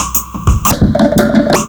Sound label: DRUMLOOP, Ragga & Dub Reggae; AKAI; USB Soundscan vol.27; DRUMLOOPS